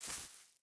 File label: footstep hide grass run; Rust